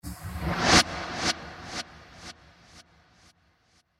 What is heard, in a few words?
Magical Impact Whoosh; Magic Sound Effects